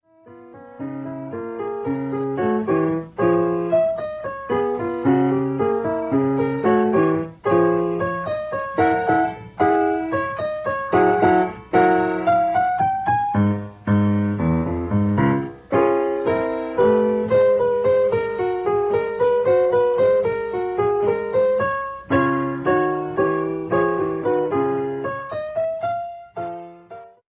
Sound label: jazz